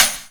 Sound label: Rimshot, Snares